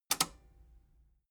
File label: metal button robust spring release disable